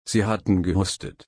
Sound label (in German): husten